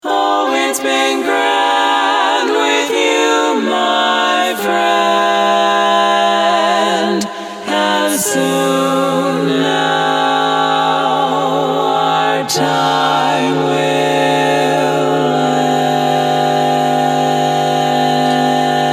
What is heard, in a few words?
Auld Lang Syne